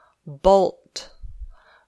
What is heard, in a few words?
bolt